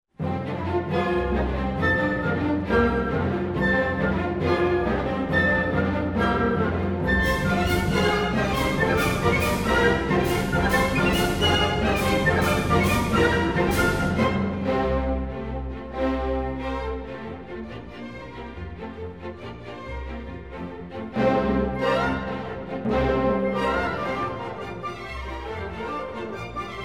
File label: F-Dur in F Major presto; Ungarisches Festival